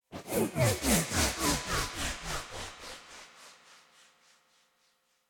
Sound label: movement tremolo; sonniss gdc